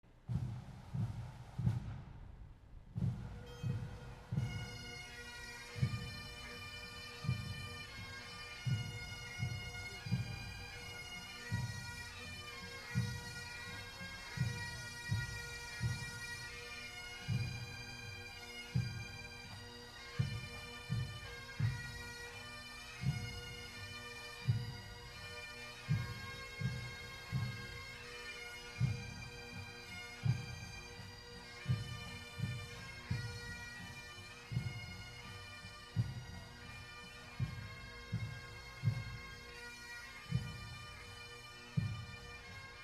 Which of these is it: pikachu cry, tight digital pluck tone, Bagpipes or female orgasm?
Bagpipes